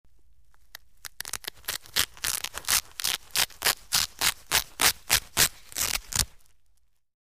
Long Slow Velcro Rip, Velcro Or Cloth Rips Close Perspective, X3 Long And